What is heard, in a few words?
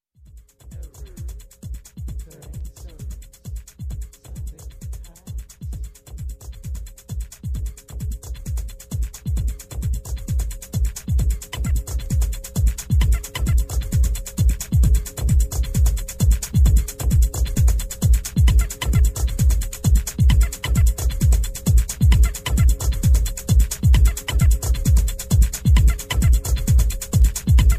Tribal tech house mix